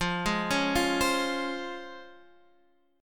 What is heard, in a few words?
C sharp Major 7th